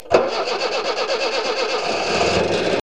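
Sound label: Datsun; Datson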